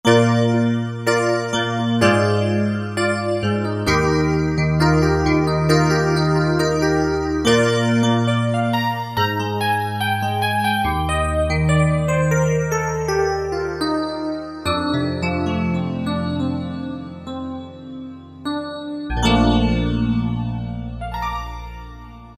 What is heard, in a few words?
FM E Piano, Alesis QS8; Factory Preset examples